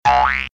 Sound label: Hop; F.A.M.P.S